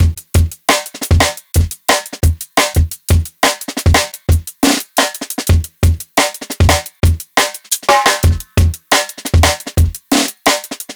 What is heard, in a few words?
Live Jungle Drum Break, 175; JungleBreaks24